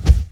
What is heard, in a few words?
coil; quake